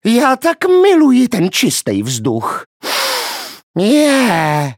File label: funny